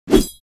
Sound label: Knightly sword swing fast